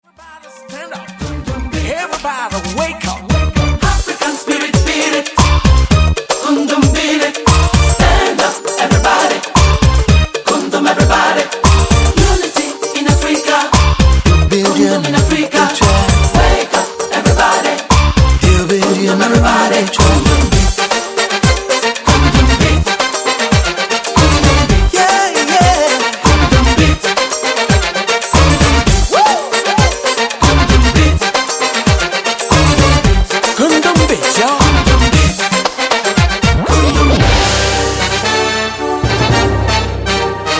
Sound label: koundoum beat